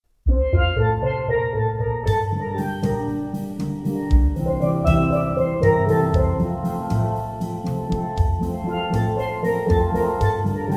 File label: Quartet popular, Steel Drum Band